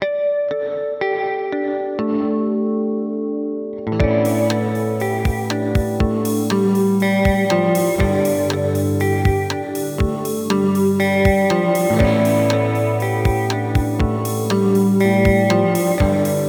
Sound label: Rainger FX Reverb X